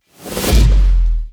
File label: Jolt Standard